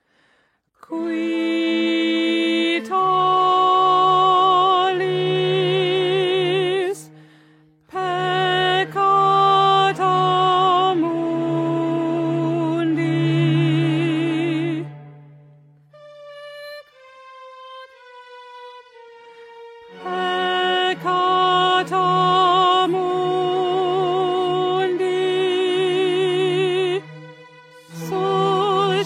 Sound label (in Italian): contralti; GLORIA VIVALDI